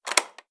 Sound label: key unlocked; svencoop; arctic incident; ambience